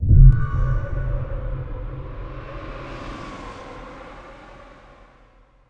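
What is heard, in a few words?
blink ambience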